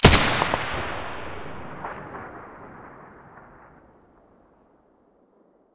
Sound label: muzzle m249 stereo, weapons